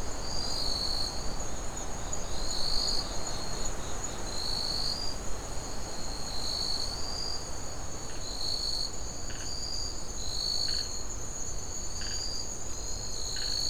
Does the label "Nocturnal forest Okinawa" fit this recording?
Yes